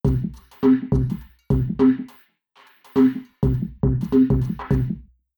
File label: drums impulse 0m palisade; Goseck